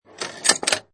Pinball Sound Ball Roll With Metal Levers Switches; Video Game Sound Effects